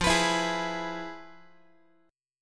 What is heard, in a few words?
F-Minor Major 7th Flat 5th, Guitar; FmM7b5 strummed; JGuitar